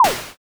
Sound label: stone throw